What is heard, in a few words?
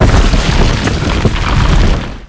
collapse ceiling small